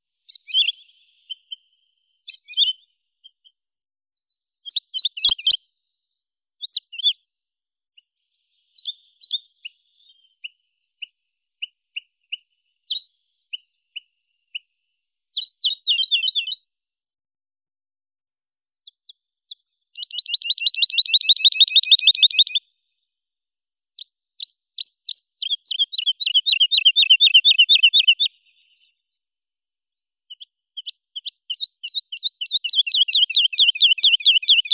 zhavoronok lesnoi lullula arborea, zvuki prirodi

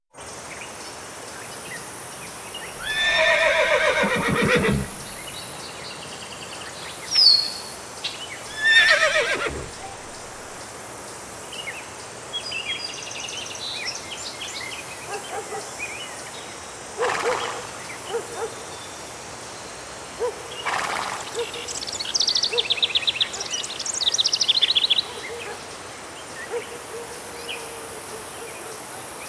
atmosfera selskih zvukov

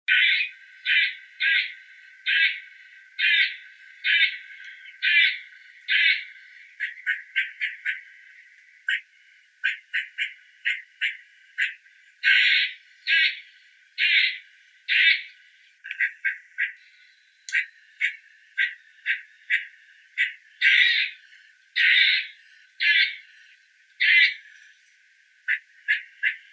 belka obiknovennaya sciurus vulgaris

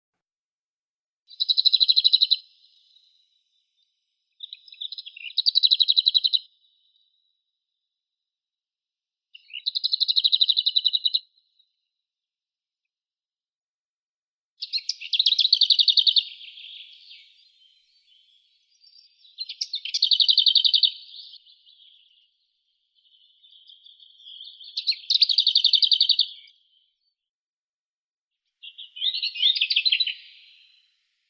slavka melnichek sylvia curruca, zvuki prirodi

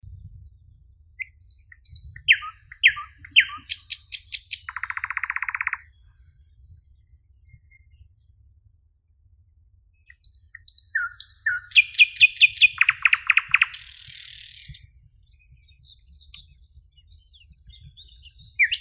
solovei obiknovennii luscinia luscinia, zvuki prirodi

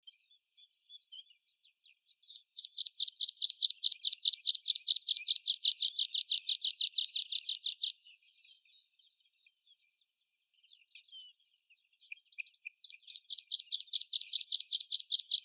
konek lugovii anthus pratensis

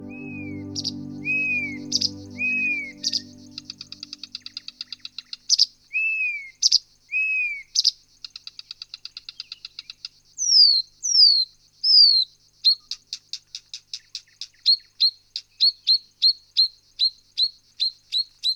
zvuki prirodi trostnikovaya kamishovka

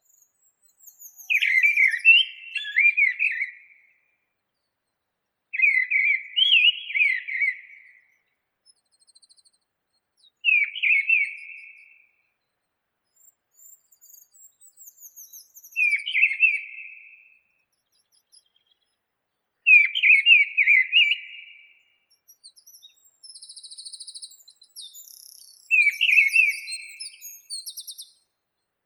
drozd deryaba turdus viscivorus

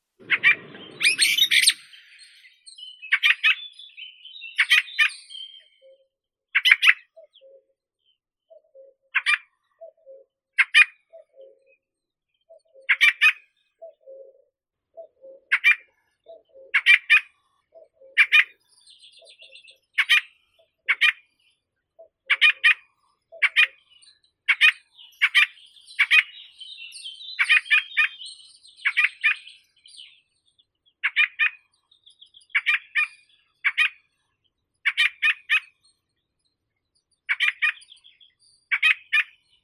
drozd ryabinnik turdus pilaris

